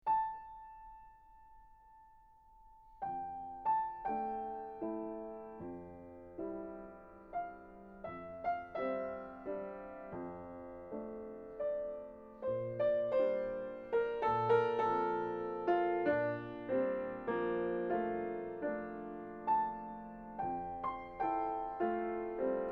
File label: Lullaby, acoustic